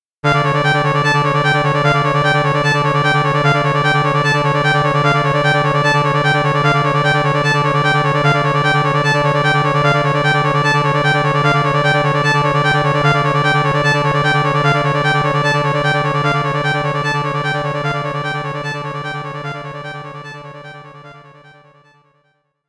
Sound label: Gramophone